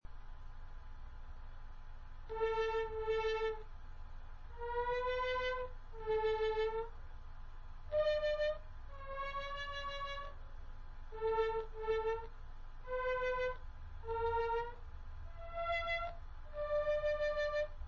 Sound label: theremin